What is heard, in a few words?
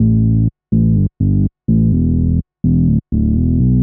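synth bass, house, 125, A; eJay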